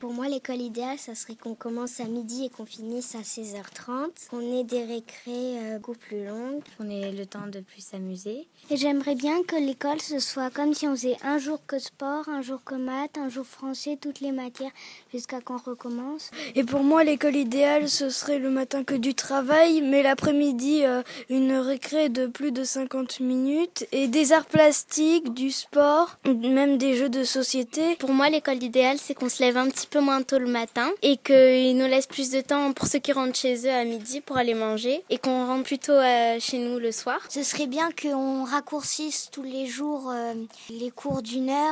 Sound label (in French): rythmes